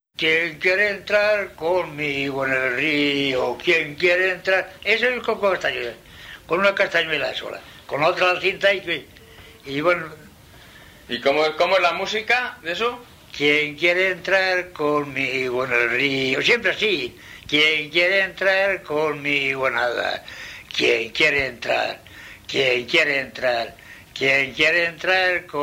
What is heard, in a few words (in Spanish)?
El arbol danza de cintas de Ceinos de Campos